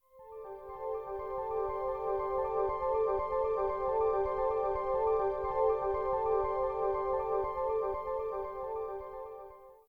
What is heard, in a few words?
gentle flowing